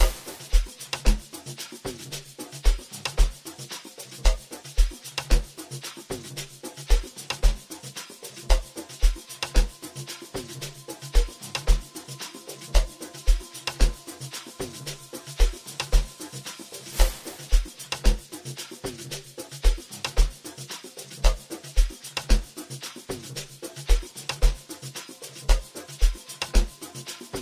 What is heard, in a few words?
Deep Narratives Noise